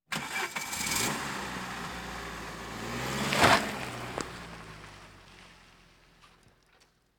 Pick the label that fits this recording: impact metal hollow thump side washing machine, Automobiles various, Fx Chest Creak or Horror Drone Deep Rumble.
Automobiles various